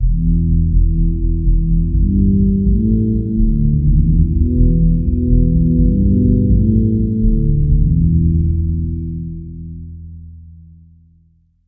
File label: steel drums bass